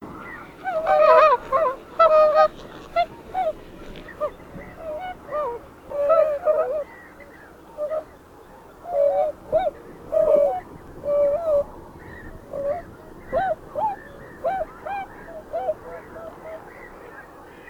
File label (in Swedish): Mindre sångsvan